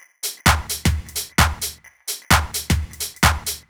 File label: Drum Loop 130, Downtown House; Drum Loops 130bpm